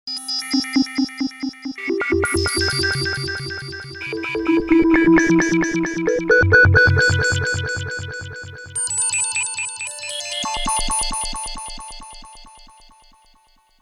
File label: Modal Craft Synth 2.0